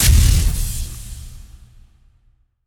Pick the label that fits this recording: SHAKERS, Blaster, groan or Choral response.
Blaster